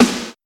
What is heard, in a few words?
snare drum sample b key, snare sound tuned to the B note; snare drum samples